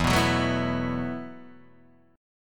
E Suspended 2nd Suspended 4th E, down, Guitar, DADGAD